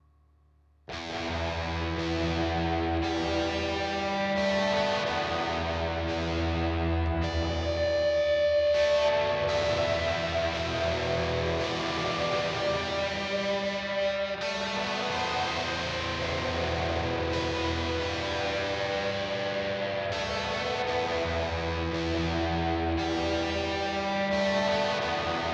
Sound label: High Gain EHX Oceans 12